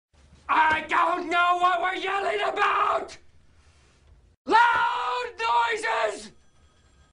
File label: Brick Loud Noises